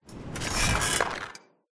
Rope Swinging

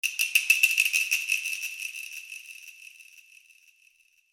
wood

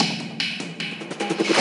Desert Percussion; G.I. Joe The Rise of Cobra; NDS

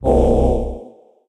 Sound Sign Satanic bible; Peace, Death

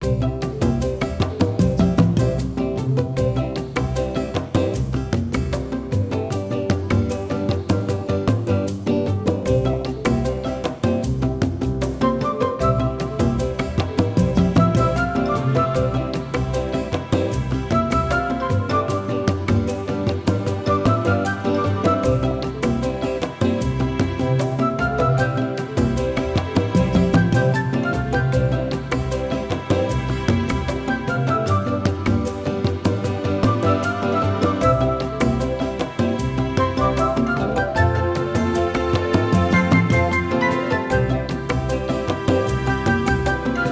Minigame Birds

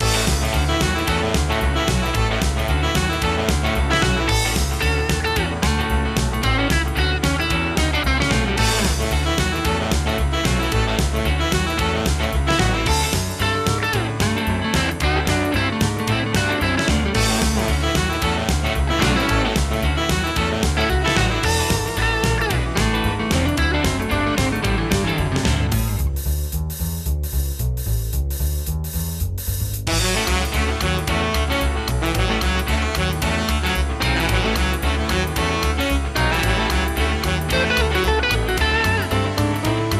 Swing Dude Swing

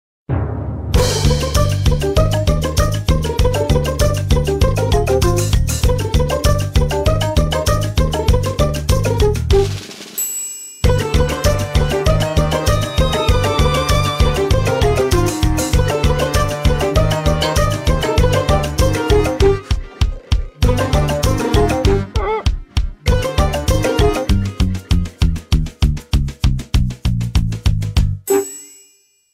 Fire Sale (Alternate Version); Call of Duty Zombies

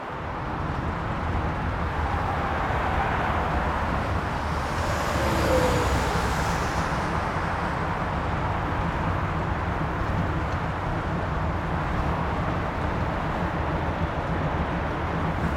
ambience - car chase; dlc dark knight

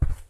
footsteps carpet Walk

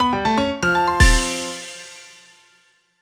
Jingle Light Board Finish Light; Clubhouse Games: 51 Worldwide Classics

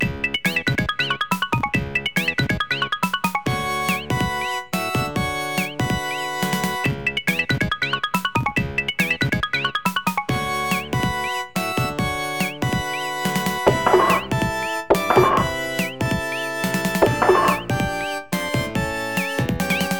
Bonus Stage; Capcom Game Music Vol.2; Boards

